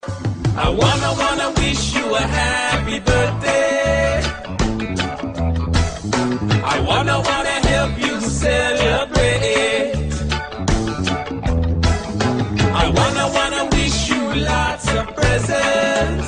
happy birthday reggae version

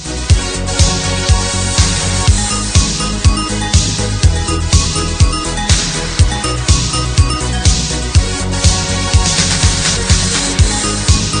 hot disco

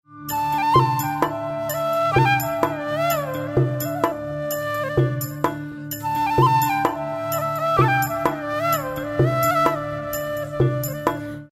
bangladeshi flute

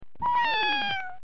cat meow

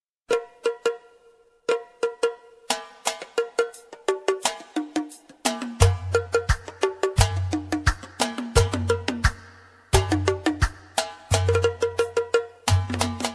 arabic drums

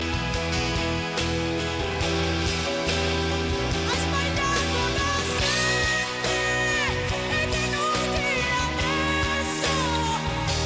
mmmmmmm

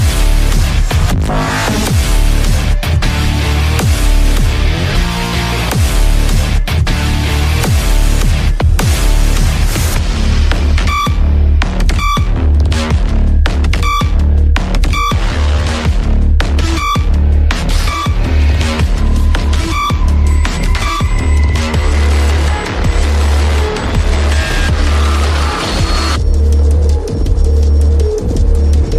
flesh metal; doom

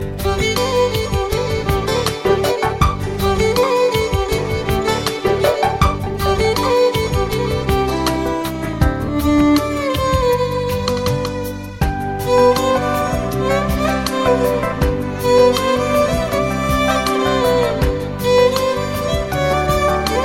slow soft music

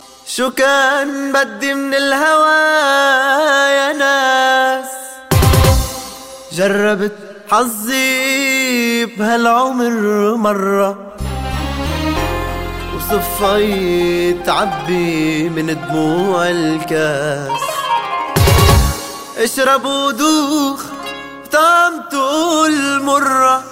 arabic tone

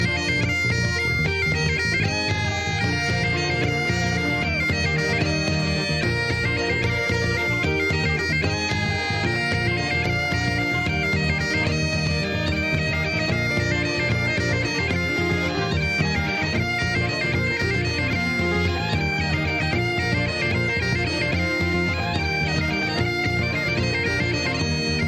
galician waltz

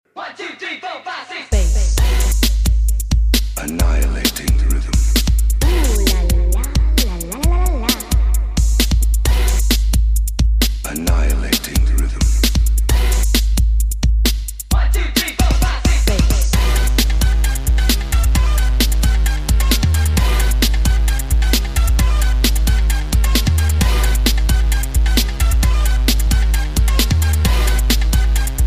bas dominator

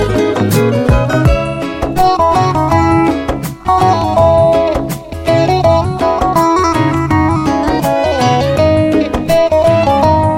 persian emotional

